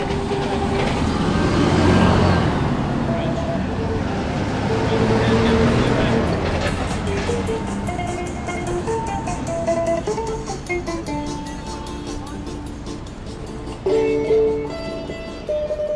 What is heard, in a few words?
guitarist on jerusalem street corner